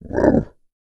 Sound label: DOG BARK, VOCAL FX; Spectrasonics Vocal Planet; Jazz FX